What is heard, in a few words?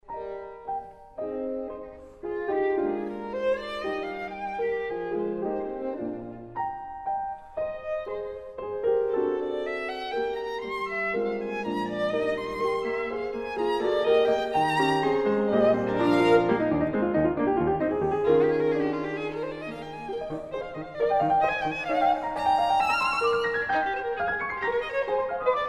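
violin sonata; saint-saens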